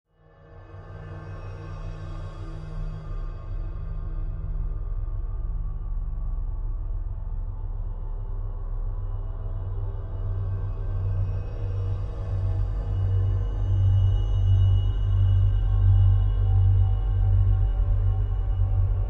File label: Bed Deep Space Drone